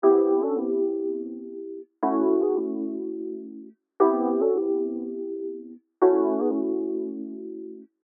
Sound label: Piano